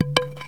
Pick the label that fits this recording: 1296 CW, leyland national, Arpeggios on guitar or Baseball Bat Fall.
Baseball Bat Fall